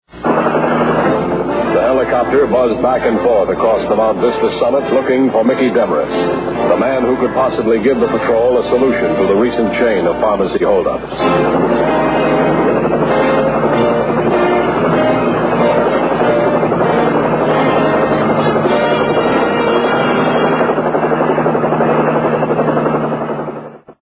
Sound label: copter; highwaypatroltv